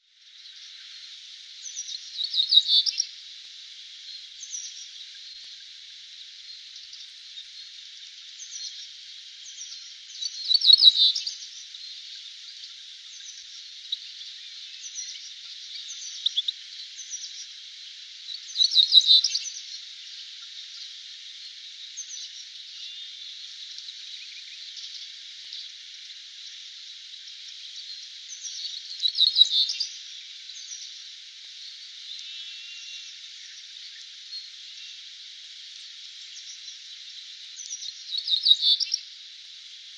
Gobemouche gris, oiseaux; birds

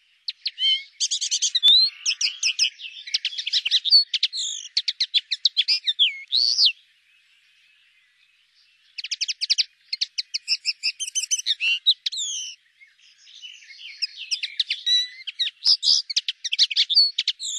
Linotte melodieuse, oiseaux; birds

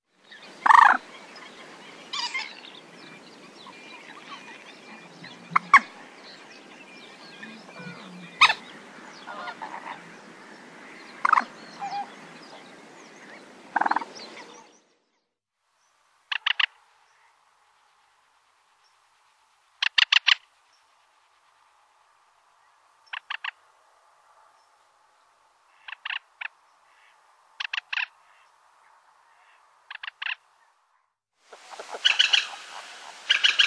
Gallinule poule d'eau, birds

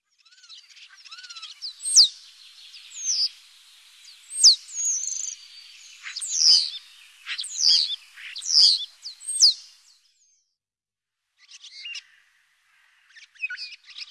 Etourneau sansonnet, birds

